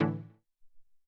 Tek Cow Bl

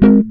GTR CHORD; Univers Sons; Jazzistic

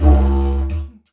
Didgeridoo Kit Samples

